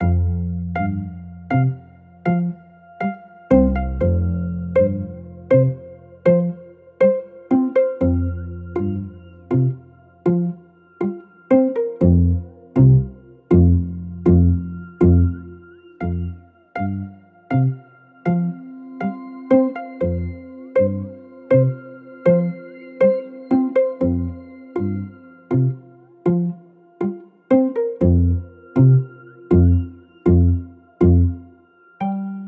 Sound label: the village, free music; lvl 2